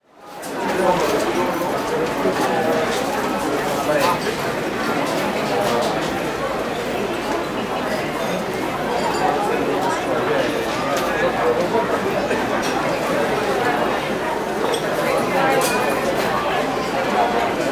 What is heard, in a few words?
Restaurant Busy Deli; Sound Ideas; Walla Series